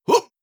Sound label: effort grunt male